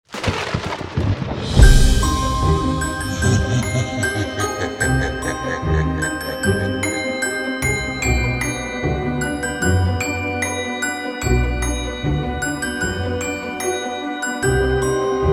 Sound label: Halloween Moon